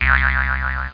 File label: harp; Kid Vid Grid (1994); Jasmine Multimedia